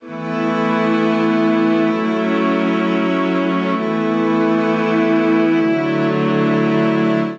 Progression Blessings V-vi7-V-IV9 A Major 130 bpm, String Quartet; MIDI Clips; Ableton; Factory Packs; Spitfire Audio